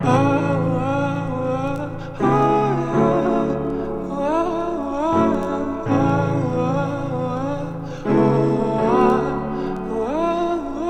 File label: 164 BPM A Maj, Compositions; Cymatics; Zodiac Vol 2 Beta Pack; Zodiac Vol 2 Premium Compositions